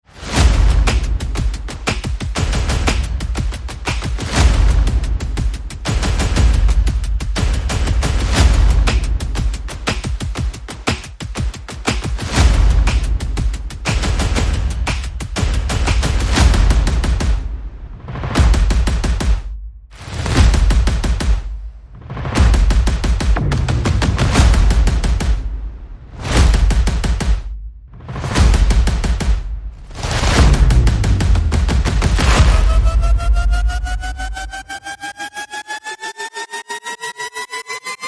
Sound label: Percussion and Effects